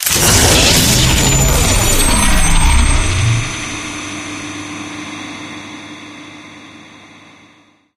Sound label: powerup pickup uber; Source Engine; tf